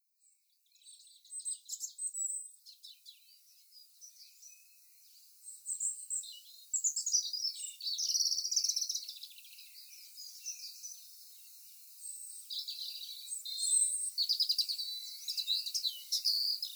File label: British Bird Song Dawn